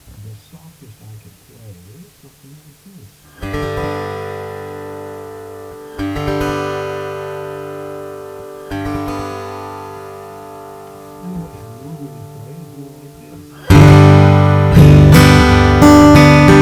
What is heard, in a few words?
H6 Pickup; 24-bit